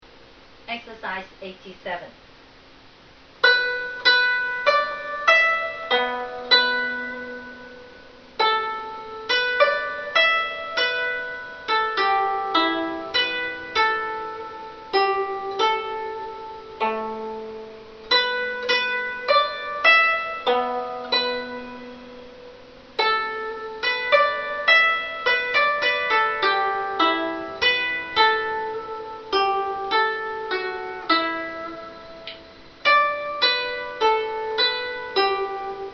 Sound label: zheng